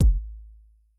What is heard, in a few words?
Kick, Yamaha RY20